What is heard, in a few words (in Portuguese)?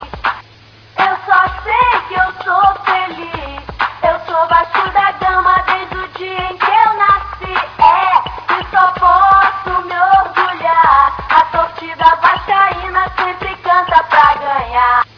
vasco rap